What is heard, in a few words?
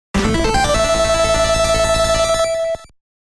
Mission Complete 8bit Version; Blaster Master Zero 3